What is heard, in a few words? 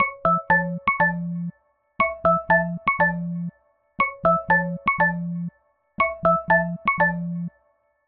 Nightingale, ringtones; Samsung Galaxy S26 Ultra